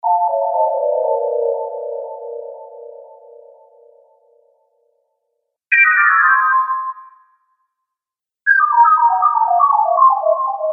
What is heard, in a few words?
Scifi Game Fail Collection